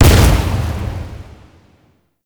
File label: Spin Beam Explosion; Enemies; Weapons; Warframe; Tau; Sentient Dactolyst